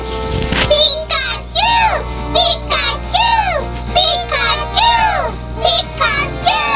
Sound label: pika pika cheer